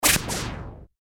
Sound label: impact